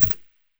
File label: scar silencer mono far